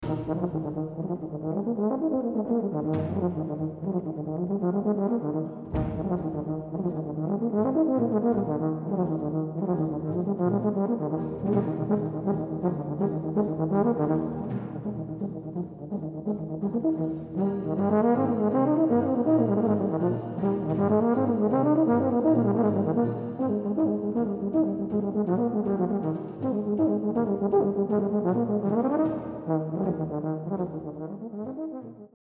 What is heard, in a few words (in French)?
extrait euphonium